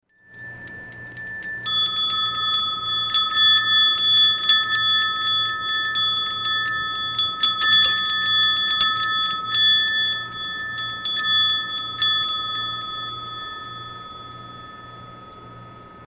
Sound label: Trio Bronze Bells